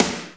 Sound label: Snare, Akai MPC-500 Drum Machines; Toolkits; Snare TKit